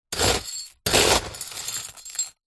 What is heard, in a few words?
metal scrapes and digs into small stones; foley